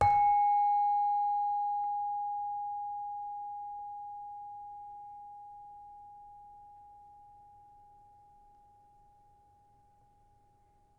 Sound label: Saron hard, gamelan